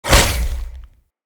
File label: Infested Femur Shoulder Barrage Fire